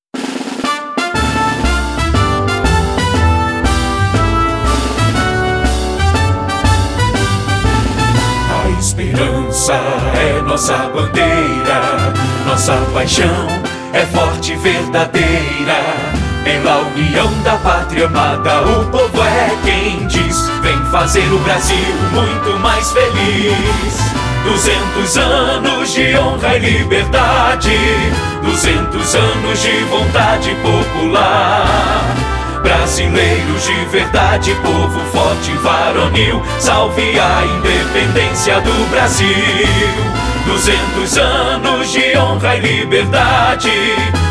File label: Jingle